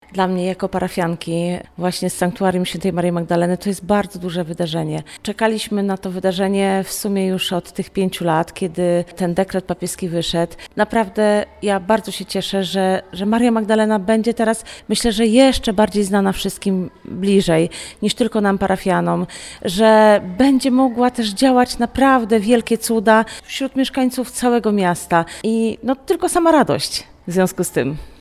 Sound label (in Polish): mazurek